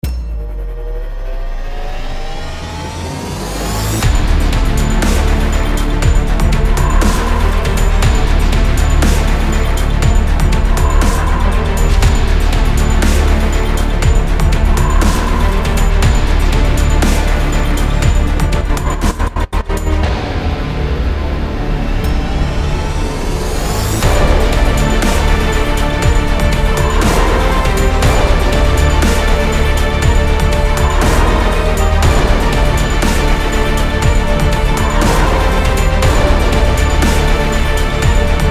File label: Hybrid Trailer